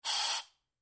Long Air Release From

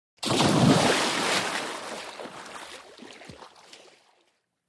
Splash Lrg Sml Waves

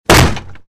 Heavy Single Impact

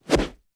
Medium Swish

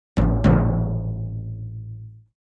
Drums Toms C Low Hits D

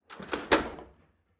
door glass close

